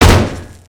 impact; emotes

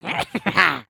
Spawn; Lego Strombie; creatures organic

